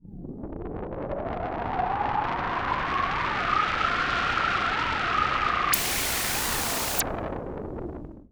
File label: Roland E Noise, Roland S100